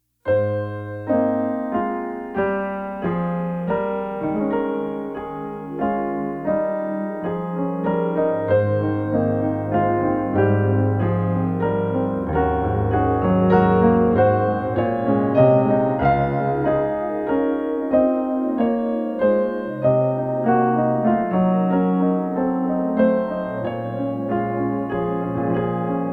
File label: Piano